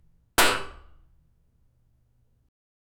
the clank of the bolt

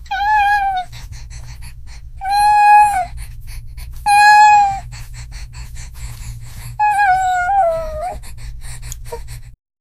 small puppy crying and wh

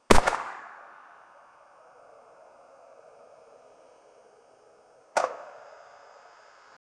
gun shooting ak47

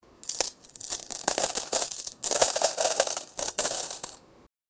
the sound of coins in, bag

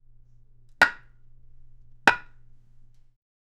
the man hit wood by; human actions

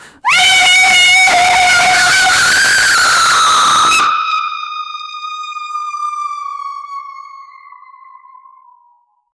terrifying banshee shriek; spectral

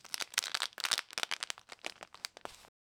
soft repetitive crinkling; household

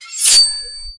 a sword slicing through

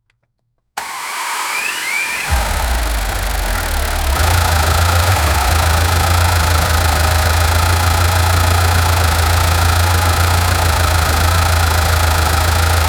7 liter v8 engine, cold start

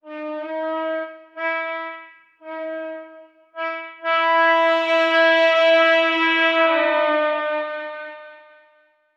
horn sequ, vehicle; dukes of hazard

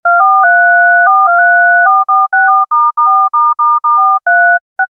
bluetooth speaker pairing; communication

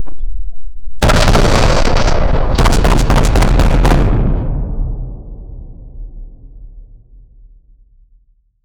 door

